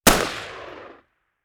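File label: colt ar exterior shot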